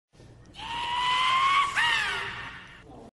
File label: iiiirraaaaa